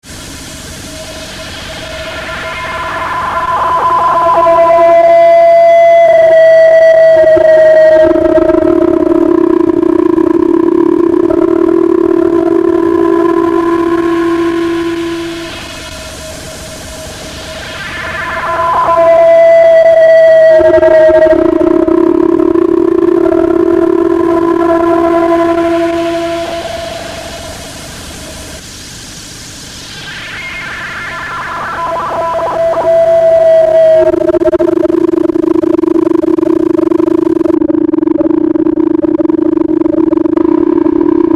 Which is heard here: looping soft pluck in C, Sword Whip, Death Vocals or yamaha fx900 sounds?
yamaha fx900 sounds